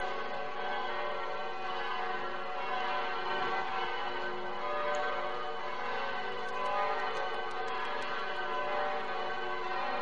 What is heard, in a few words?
Bell; medjugorje 2015